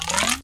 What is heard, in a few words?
spine zip, zaps and beeps